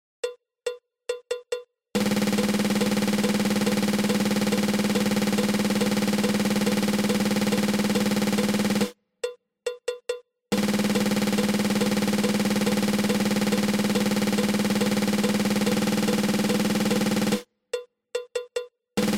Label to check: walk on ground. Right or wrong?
Wrong